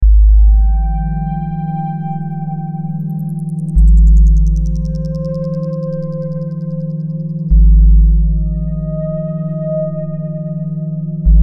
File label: loop; serumwerk